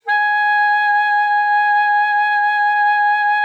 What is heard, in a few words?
G#5, alto sax; Xsample VOL-2